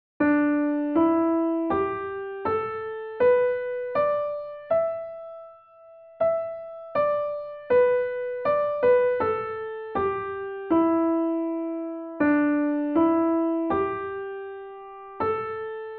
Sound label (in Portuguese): escala pentatonica